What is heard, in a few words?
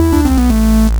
death